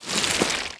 close, zombie blind, foley; creatures

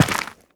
step, footsteps, gravel; player

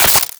spark tiny

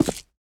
footsteps concrete

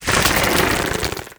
extra electrics emerge

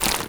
zombie move short, foley; creatures; Half Life Model Pack

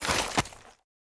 snowball equip; player; winter

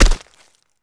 land mud, footsteps

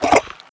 breath in, headcrab black; creatures

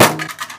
plastic box impact bullet; physics

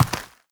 step, footsteps, player, dirt

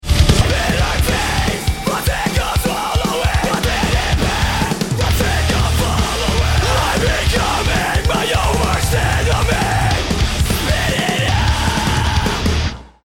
round ten sec count, music

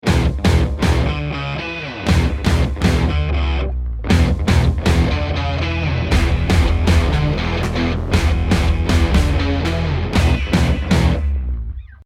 bomb ten sec count

